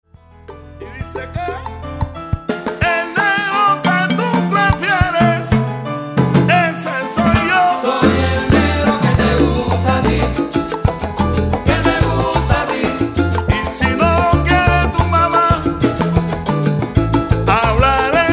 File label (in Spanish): Banda Salsa Cubana Popurri De Sones